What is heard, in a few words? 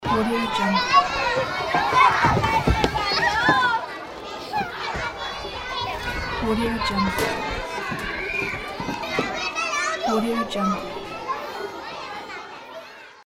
kids playing ambience